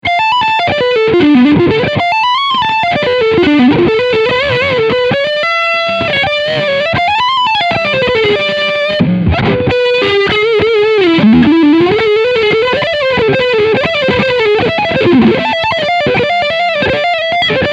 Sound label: High Gain Mania